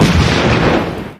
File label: explode